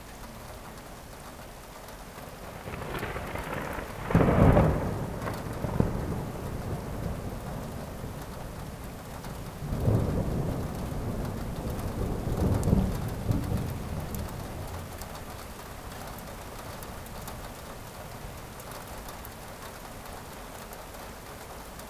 distant thunder; 99Sounds; Rain And Thunder

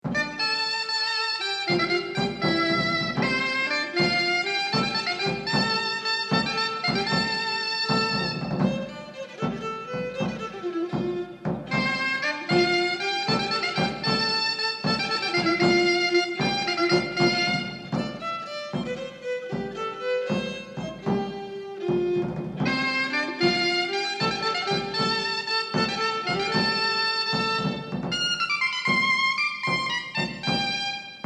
Estampie Royal; La Quarte

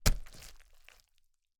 gore - wood beating flesh wet blood splat - soft; Timothy McHugh; Gorification [HD]

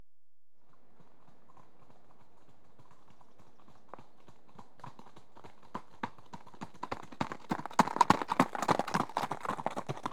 Two horses trot past on gravelly; BBC Sound Effects Library

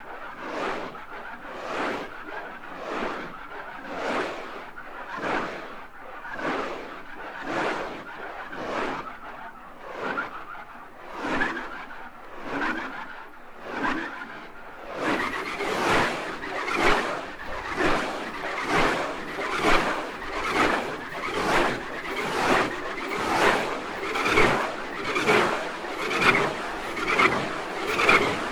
Whoosh Metal Utensil Holder Spun Around; Sartorial Sound; SWOOSH THERE IT IS

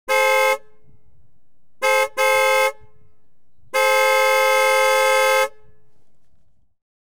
CAR, Rolls Royce Silver Sprite; Transportation; BBC Sound Effects Library

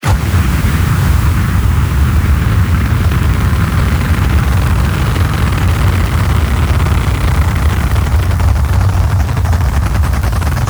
Designed Fire - Impacts - Large, ignition; Gregor Quendel

